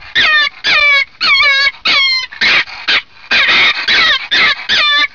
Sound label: grey fox kit